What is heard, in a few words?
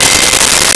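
Mute Trumpet C5; Nintendo; July 2020 Gigaleak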